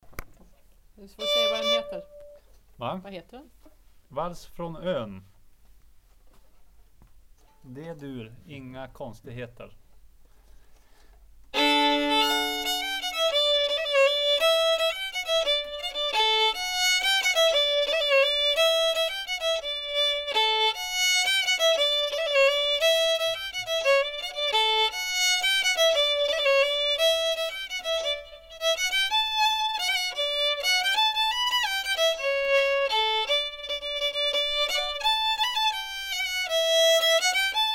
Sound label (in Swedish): Vals från ön